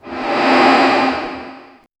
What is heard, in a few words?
relief hits accordion; instrument